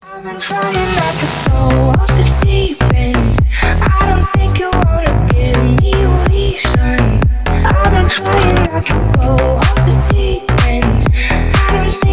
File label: remix